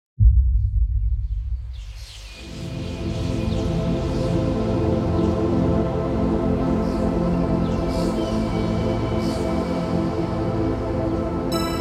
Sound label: ambient mix; deltarune